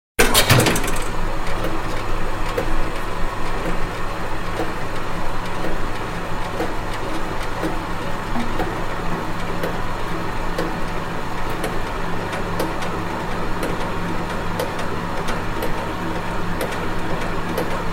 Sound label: Clock Tower 19th Century; Hollywood Edge Historical Series